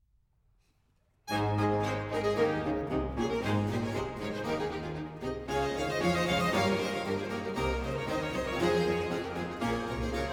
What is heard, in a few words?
violin concerto in a major; i ohne satzbezeichnung